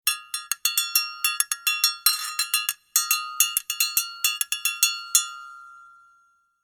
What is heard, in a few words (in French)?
son racle metal